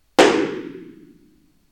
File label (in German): Teppich Clap, Hoerraum